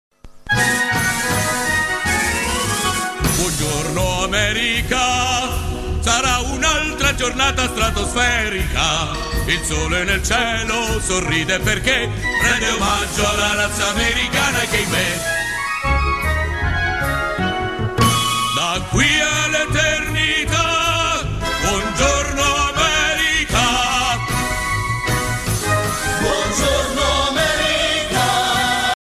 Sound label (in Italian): Sigla italiana; American Dad